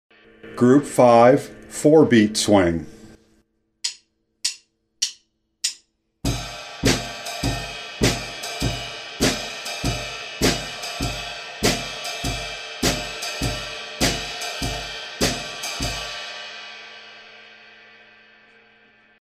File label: four beat swing